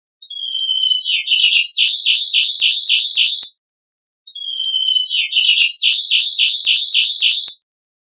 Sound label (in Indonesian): audio masteran roller; versi asli